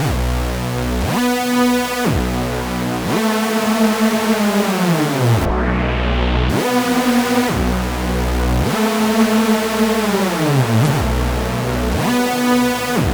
prophet08

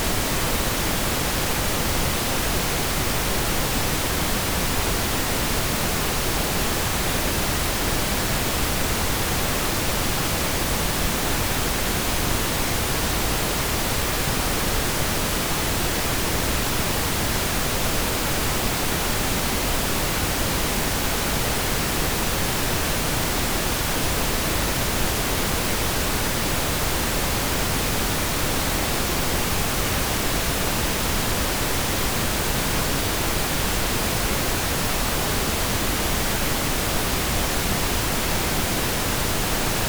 Pink unfiltered; Pink 0vu unfiltered 96k 24bit DScope

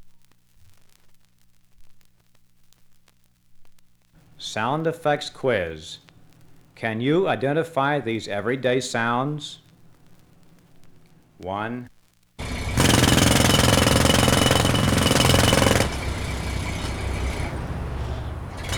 Test vinyl Sound FX quiz; 1957 vinyl test record

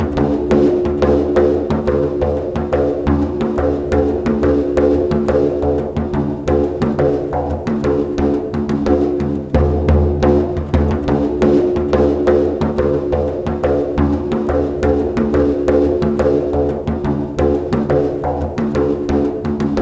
bodhrán 88 bpm

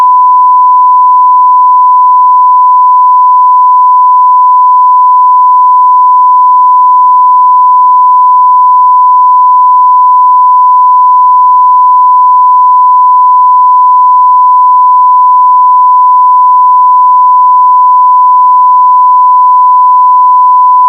1k Tone 0DBFS; 1k Tone 0DBFS 48k 24bit DScope

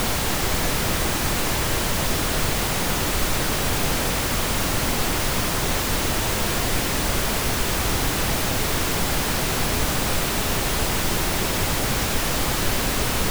Pink unfiltered

